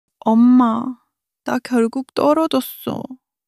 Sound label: crying; monologue